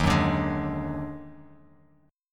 D sharp Minor Major 7th Double Flat 5th D sharp, down, Bass Standard; strummed; chord